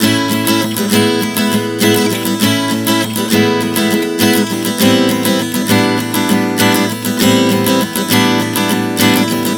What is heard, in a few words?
Progression 100 A-E-Am-G, Acoustic Guitar, Chord Progressions 100 bpm